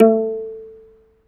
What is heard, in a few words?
AFRO HARP A4; AFR. HARP2; Heart of Africa